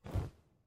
cloth drop; soundscrate

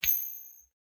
coin flip; soundscrate

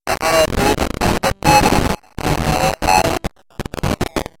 computer glitch

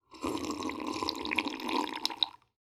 slurp

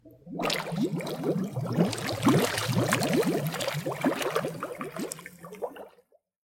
surface bubbles; soundscrate

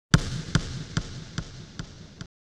basketball ball bounce to a stop

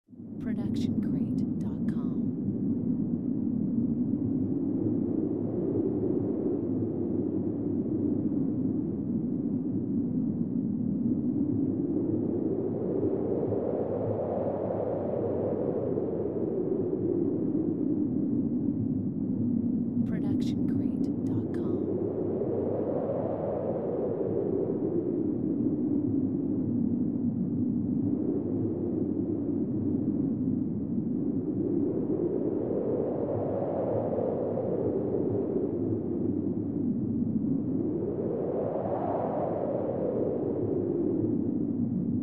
Windy Weather; soundscrate

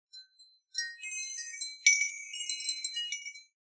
Wind Chimes B

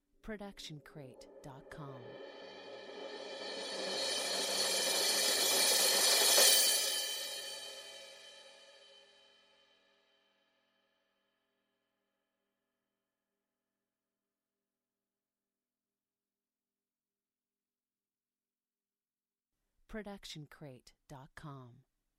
crash cymbal 16 inch swell mallets long; soundscrate

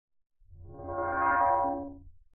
metal swing; soundscrate

